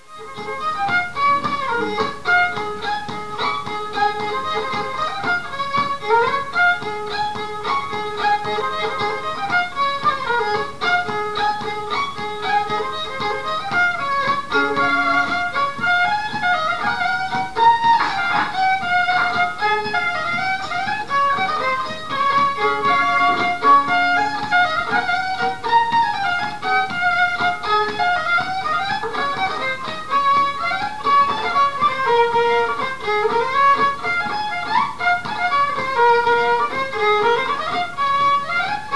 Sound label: Bluestack Polka, fiddle music